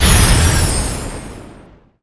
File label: Explosion Nova; Qickskil